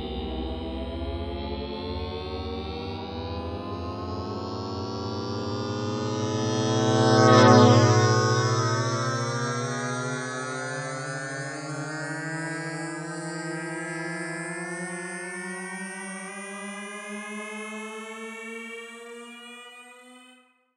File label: LIFT-OFF, FLY OBJECTS; AKAI Anime Sound FX